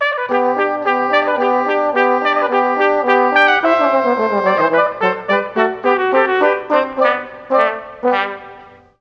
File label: Tbn Trp Ob; Juilliard Music Adventure